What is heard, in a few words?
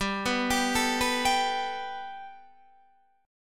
G Major Add 9th Guitar DADGAD, chord